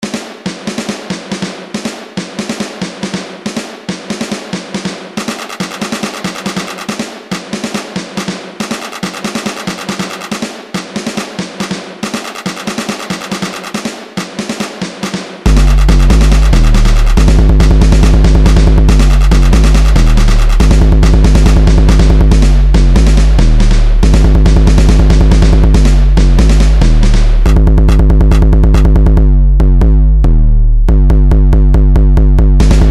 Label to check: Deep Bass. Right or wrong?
Right